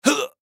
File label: Human Grunt; Grunt Reaction Fast Element Male 25 Yrs; Grunts; Sound Effects:Voice